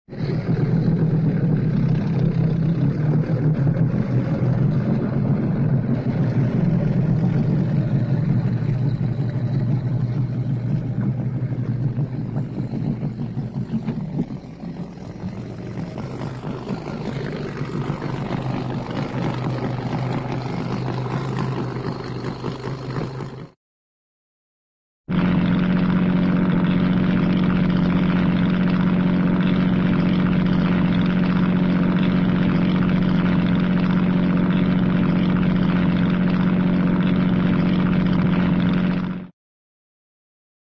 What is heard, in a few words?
boat, outboard large idle; cartoon